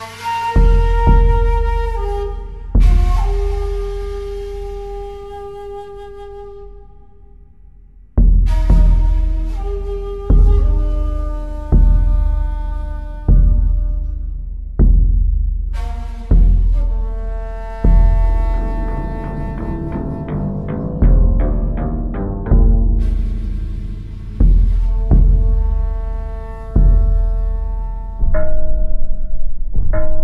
BGM; Adventure Player; psp

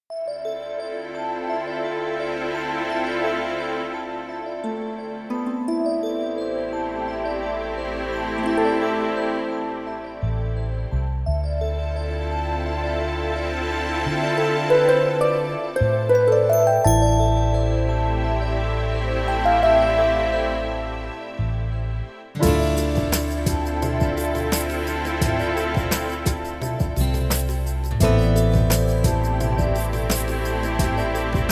Raindrops; Everlasting Summer; Bright Side

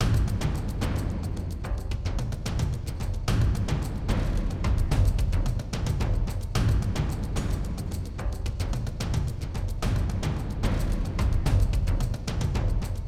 Garden Track 1 (PERC); Forced Showdown

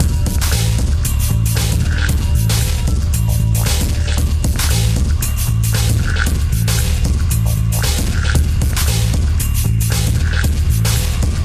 BGM; Adventure Player; psp

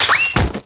common obstacle banana

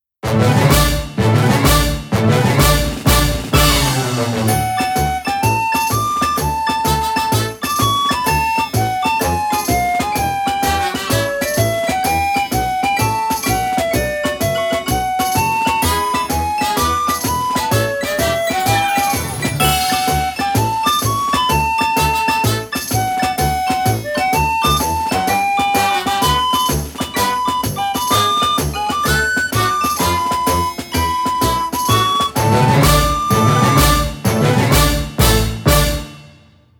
MARCH; Variable Barricade NS Limited Edition Bonus Drama CD Soundtrack

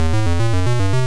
Error; Crystal Castles

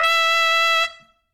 Mariachi Trumpet; WrestleQuest

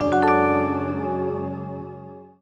levelup; roguelands

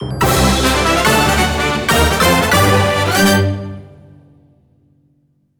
Ending Win Lose

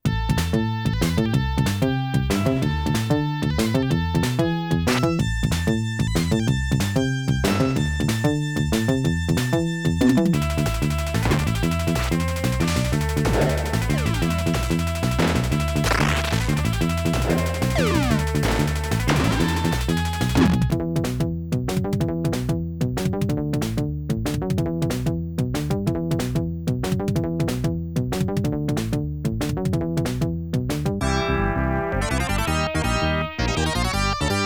All Stage Clear; Konami Game Music Volume 2

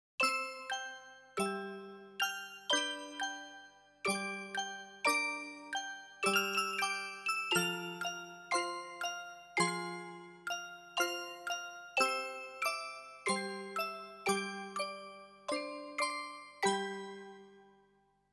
Music Box; Tales of Eternia

